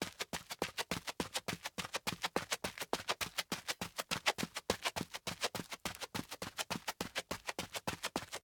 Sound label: Footsteps; LOOPERS; Tyler